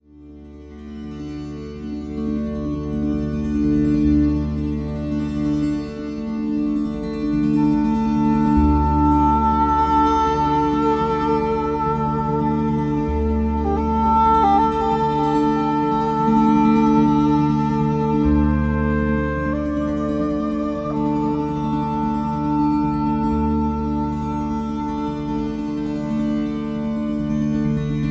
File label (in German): Körpertambura Englischhorn